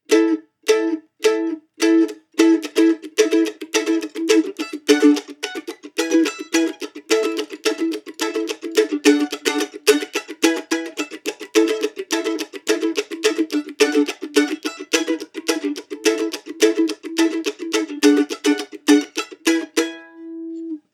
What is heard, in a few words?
four one, mandochords